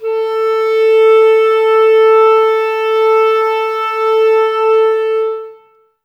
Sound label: clarinets, Wood Winds